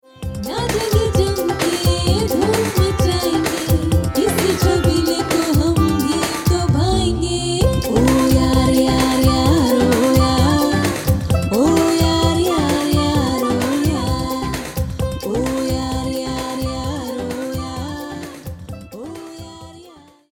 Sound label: WEDDING WISHING SONG HINDI FEMALE